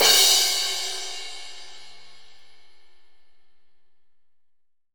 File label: LIGHT, ROCK CRASH, Crash Cymbal